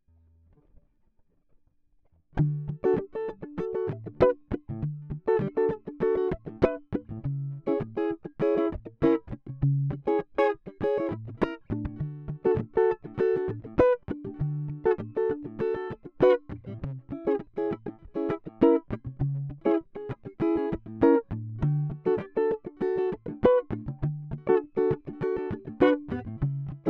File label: Small Bright Combo